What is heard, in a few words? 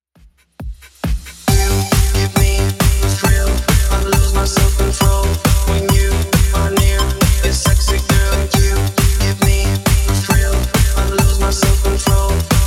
90s Redrum 136bpm